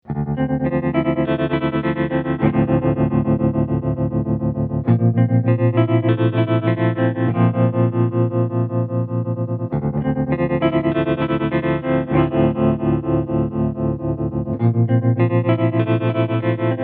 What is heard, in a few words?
quadrature, mobius